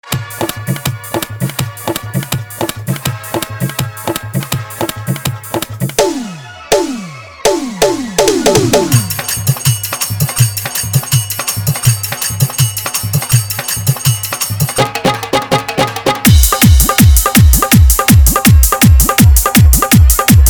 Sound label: Dj Song, Dhollki Bass Rod Dance Mix